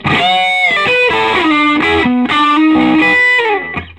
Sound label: SOLO, Vintage Blues Guitar